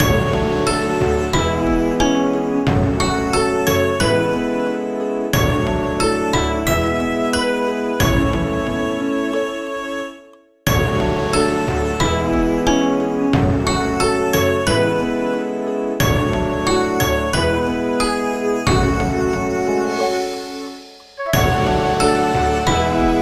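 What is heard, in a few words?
background music theme martial tournament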